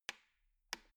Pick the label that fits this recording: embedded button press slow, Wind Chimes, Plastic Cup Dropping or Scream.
embedded button press slow